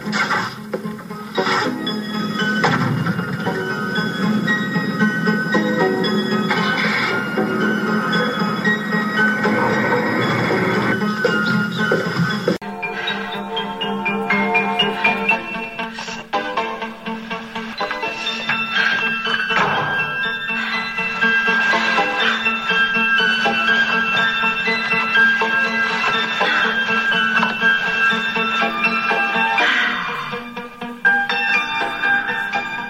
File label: dramatic theme